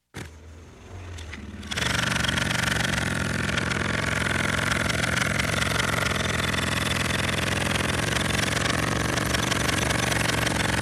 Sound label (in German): Preßlufthammer